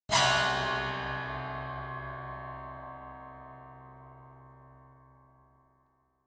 piano squeal

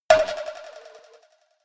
cowbell move muted hard

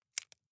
wood chip small break creak soft

